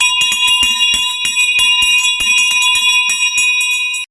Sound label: celebration bell